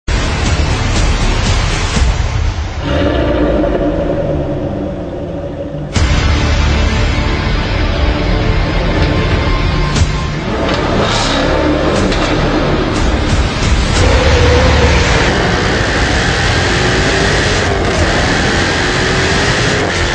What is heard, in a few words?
jaguar; F-Type X152; ferrada wheels